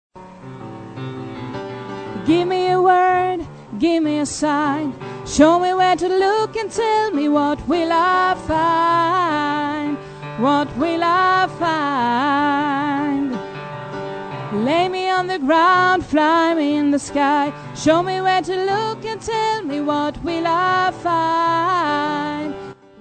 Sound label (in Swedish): bas